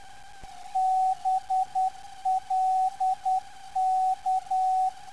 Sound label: 340kHz, ndb; BLK